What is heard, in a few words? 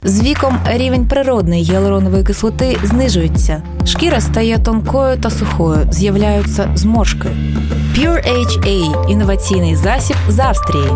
Pure Sound